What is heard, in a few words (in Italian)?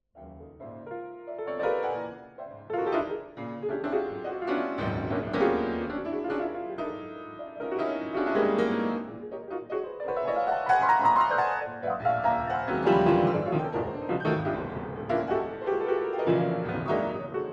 Improvvisazione creatrice; ImprovvisazioniCreatrici